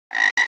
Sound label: frog ribbit; Animals; Audio